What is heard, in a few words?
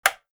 button click